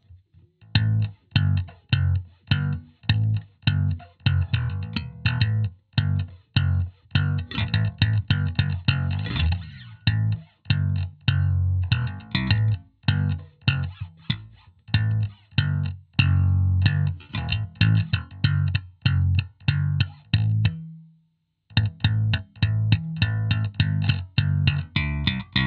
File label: slap bass; fender; bass roadworn